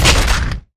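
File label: object being crushed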